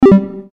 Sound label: wrong answer sound; leapster